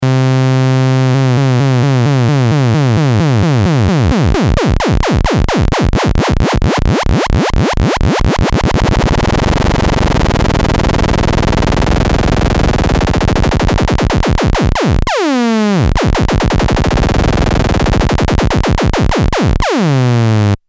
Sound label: lfo, korg ms20 synth; all LFO + frequency range